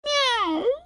cat question

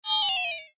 power tie impact

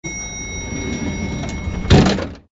Elevator door close

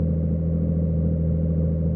KART loop Roadster

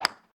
Golfing

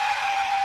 kart asphalt skid

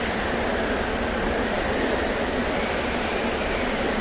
wind fly loop

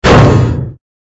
drop big weight

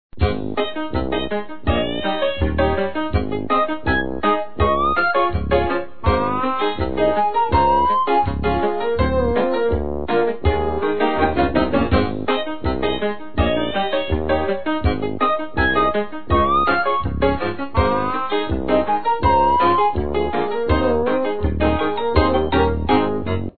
Happy Dance

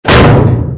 drop big weight miss

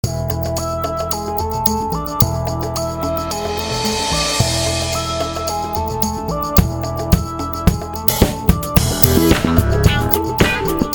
Music

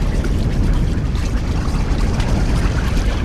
Geyser Build Up Loop

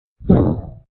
grunt

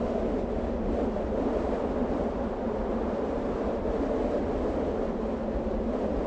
wind float loop

